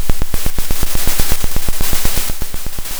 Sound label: Sound of a drum roll